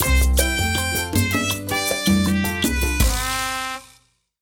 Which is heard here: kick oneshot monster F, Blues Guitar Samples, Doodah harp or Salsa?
Salsa